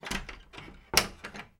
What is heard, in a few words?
household door wooden internal shut; sound effects one